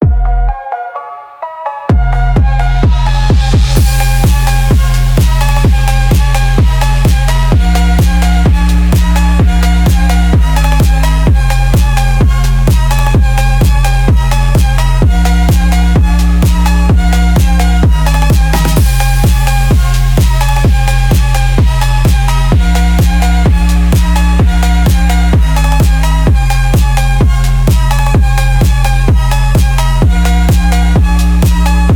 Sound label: phonk house